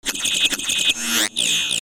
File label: Rhythm 135bpm